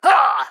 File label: Pecheneg Red Attack